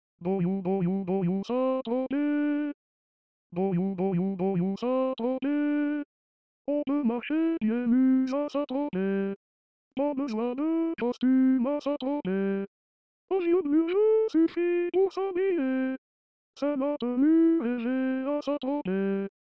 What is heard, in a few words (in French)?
hommes